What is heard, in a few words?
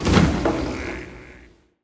Rune Apply